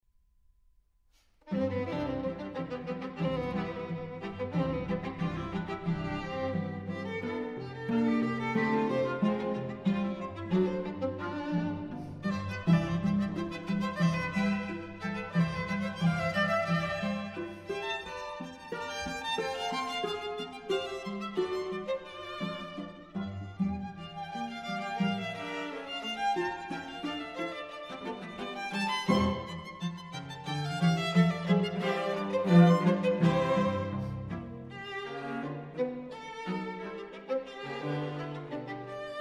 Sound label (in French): quatuor cordes, intermezzo; korngold; quatuor cordes n 2